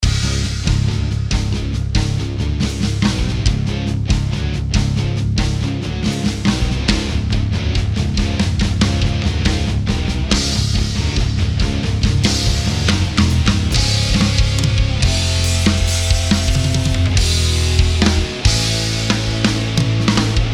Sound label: metal stompers